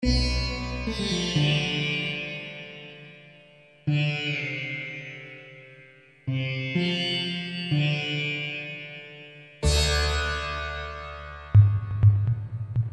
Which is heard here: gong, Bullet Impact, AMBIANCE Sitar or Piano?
AMBIANCE Sitar